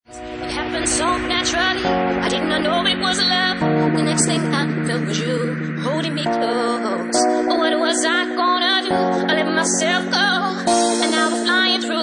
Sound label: Bassline Remix